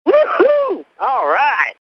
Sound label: "WOO WHOO"